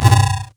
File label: UI Fail